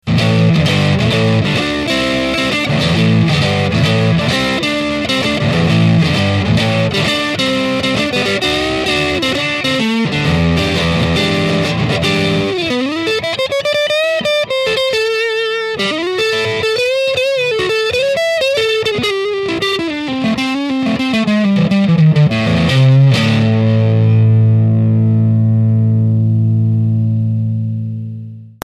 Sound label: Soft Drive Full boost TS